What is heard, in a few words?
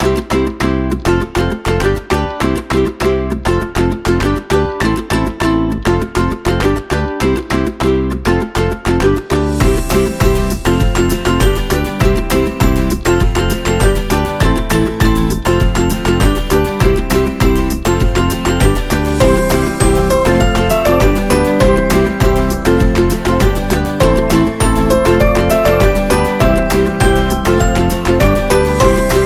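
Happy Kids, Kids background music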